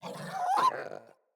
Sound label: Puppy Idle Speak Playful; Enemies; Vocals; Kubrow